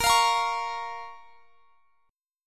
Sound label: Bb Minor Bb down; Bbm strummed